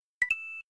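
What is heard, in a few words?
coin, 16-bit version of the coin sound; Super Mario World; Mario Coin Sound Clips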